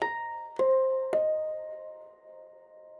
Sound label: ethno, 160, C